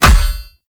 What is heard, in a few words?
Foley Action Default Body Hit Metal Heavy; Characters; Player